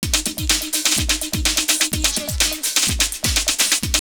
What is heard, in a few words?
Jazzy A 126, drumloops Techno&House; X-Static Goldmine